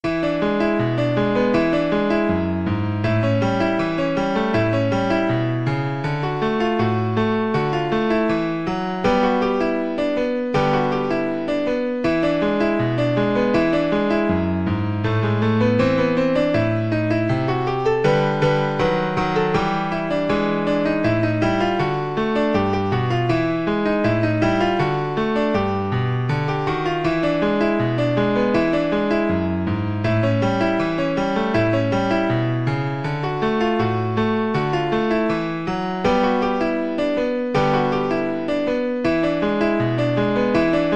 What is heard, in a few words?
PNO